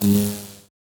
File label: wasp take off